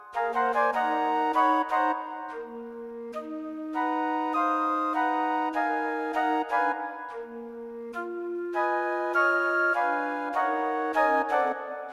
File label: Flute; Flute Quartet; flute quartet (three flutes and an alto flute)